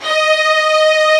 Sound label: Section String D#4; Proteus 2000; Digital Sound Factory Proteus 2000